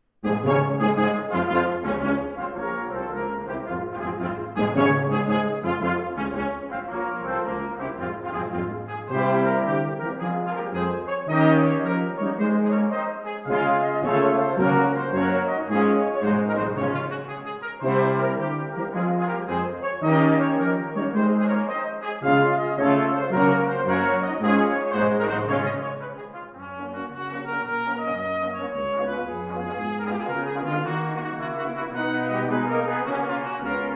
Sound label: Fanfare